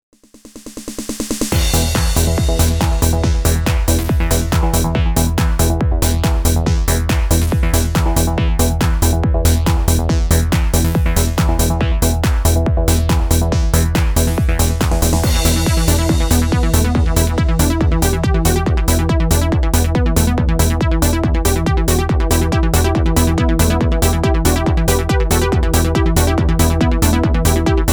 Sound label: trance; trance pro by superwave